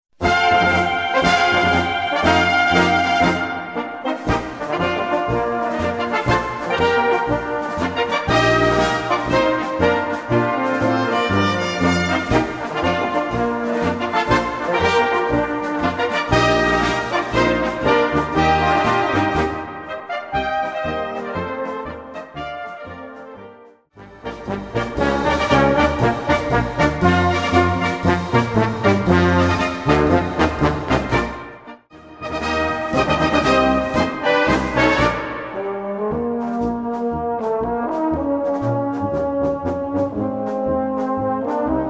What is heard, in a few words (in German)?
Marsch; Obmann Marsch